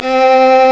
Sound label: CELLO SYN